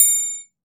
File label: triangle; AKAI S-Series CD-ROM Sound Library VOL-5